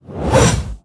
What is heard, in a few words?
2H Axe Underlay Attack